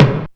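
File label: DRUMTRAKS low tom, Drums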